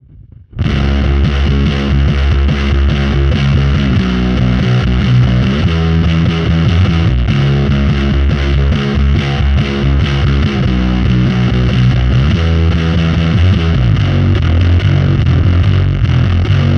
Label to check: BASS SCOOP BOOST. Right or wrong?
Right